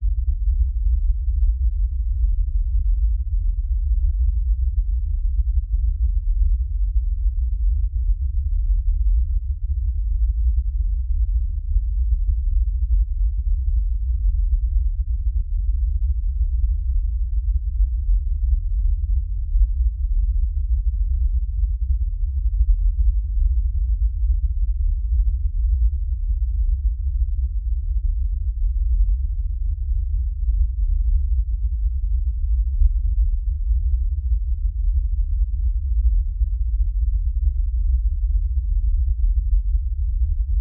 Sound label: Band Limited Pink Noise 35Hz 70Hz